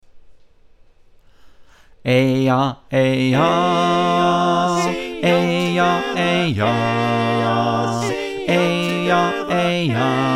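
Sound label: bass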